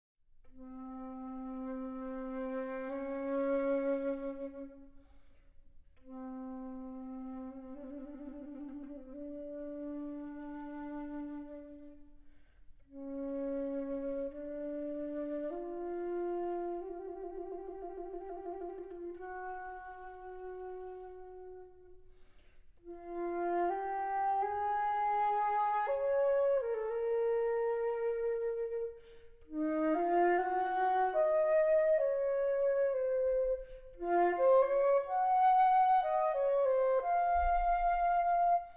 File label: solo flute